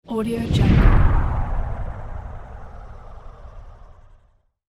rotating air whoosh; transitions and movement

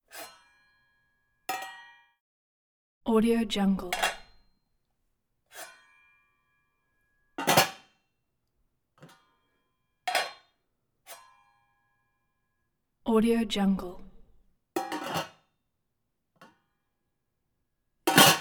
cooking pot; domestic sounds

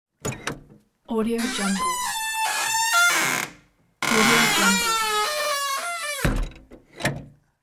old manual bus door opens and closes

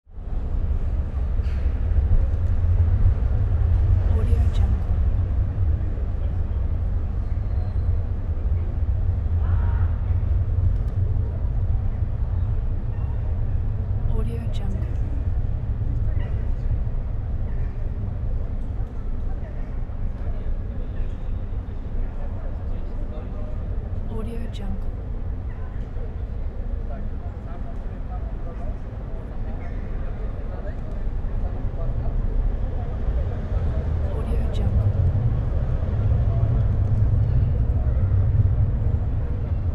city medium square midday ambience; urban sounds

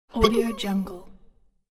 low male kill moan; ناله مرد بعد از چاقو; human sounds

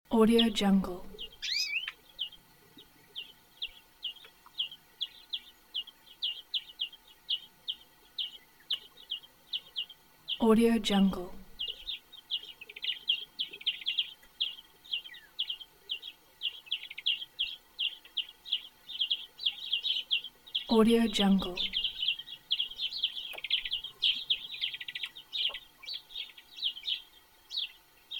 young birds chirping; آواز خواندن پرنده ها; nature sounds

